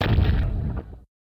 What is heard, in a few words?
explosion; buzz